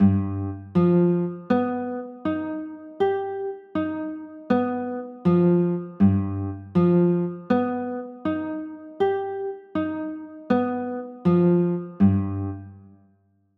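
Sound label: G sharp 7 chord, guitar; g 7 sharp 5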